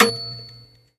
Candypet Oven Ding; candy crush saga gamerip

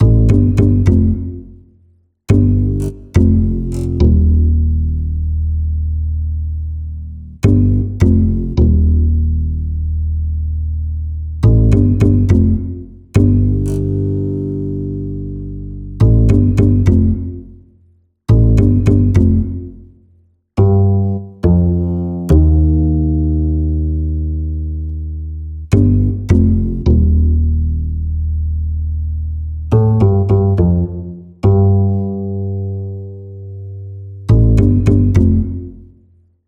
Bass

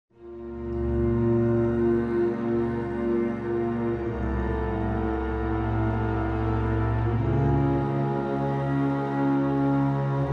Blood River Transition Music; bramble the mountain king; ps4 ps5 switch windows xbox one xbox series xs

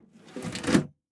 SFX case Attach Metal; PC Building Simulator

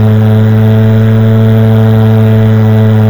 Car Max Loop

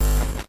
Camera Change; Five Nights at Candy's

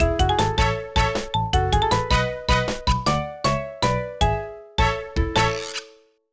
Music Win; Tales to Enjoy Puss in Boots

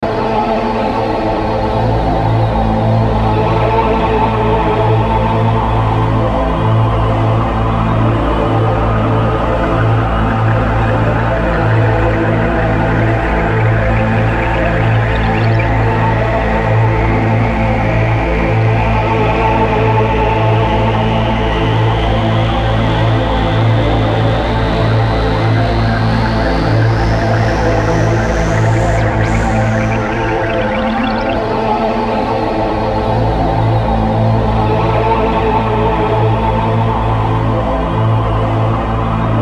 Suspense; Gato Roboto

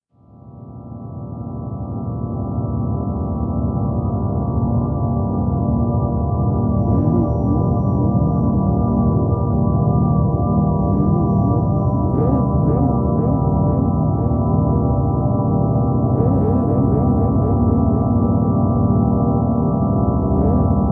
woof; omori

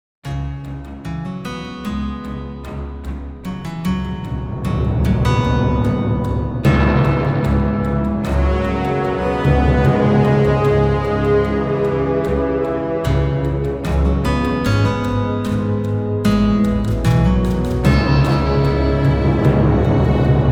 ride

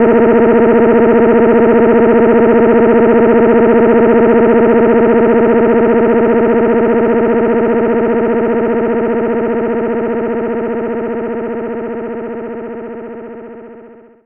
cuica

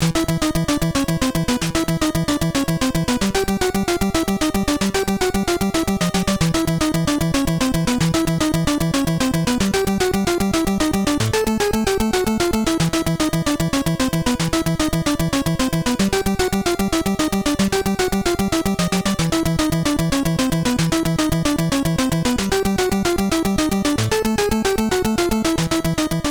Boss Fight; Getsufuu Maden; NES

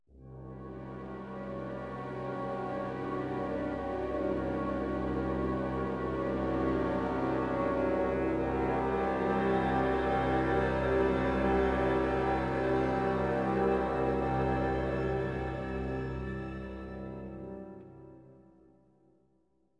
Riser; N.O.V.A. 3 Near Orbit Vanguard Alliance

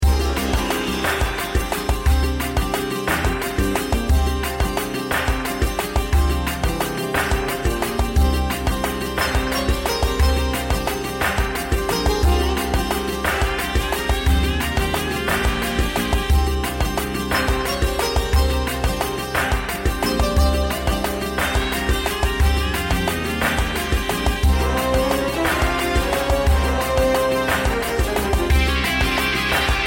bgm zone field desert; goken

